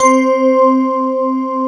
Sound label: BELL SY, BELLS; Best Service; Alpine Volksmusik